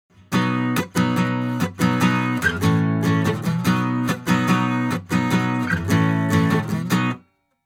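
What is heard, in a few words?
Acoustic Guitar Strumming; Shure SM7B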